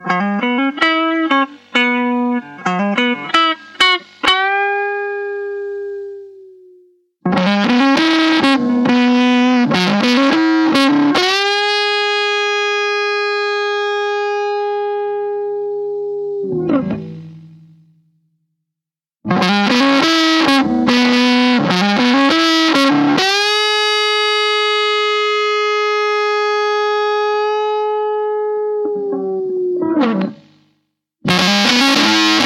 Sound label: blender lp neck lead; VHT; Lead Neck Sounds [No pedal, Benderness 0%, 50%, 100%]